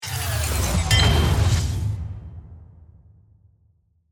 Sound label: Transforming Robot, Transforming, Robot, Power, Down, Scifi, Transition; Production, Element, Imaging, Accent; BlastWave